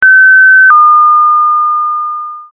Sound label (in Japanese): pinpon